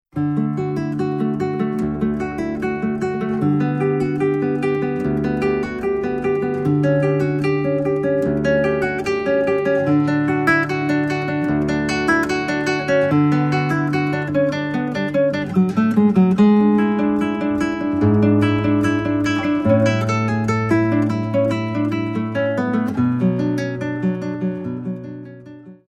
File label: preludio cello